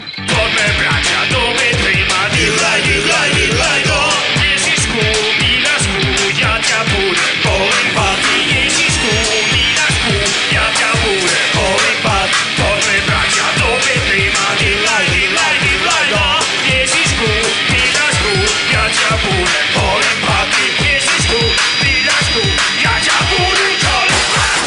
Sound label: Slovak Christmas